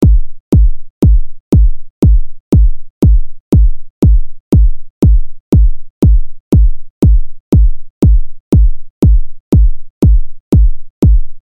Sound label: Kick